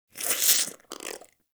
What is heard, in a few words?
Wheeze Close, Infested; Ambience; Warframe; Infested Eris